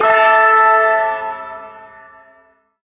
Shawzin Chromatic Chord; Chromatic Chord J; Warframe; Duviri Ersatz Shawzin; Chords

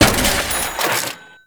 Cash Register Destroy; Warframe; Ambience; NineteenNinetyNine

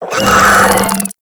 Desert Skate Vocal Death; Warframe

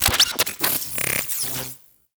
Venus Robo Fish Large Caught; Warframe

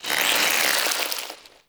Infested Plant Murmur; Ambience; Props; Warframe; Infested Eris

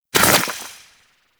Goo Gun Tail; Warframe; Grineer

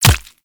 Player Bullet Impact Flesh; Warframe

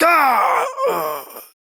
Death, Corpus Male; Warframe; Modular Corpus; Battle Barks; en

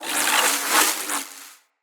Casting Device Projectile Whizz By; Weapons; Player

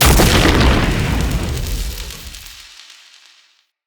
Lightning Blast Impact; Enemy; Abilities; Nimrod

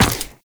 Run Ice, Footsteps; Warframe; Characters; Tenno

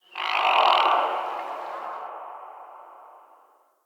Swamp Pelican; Ambience; Midrath Island

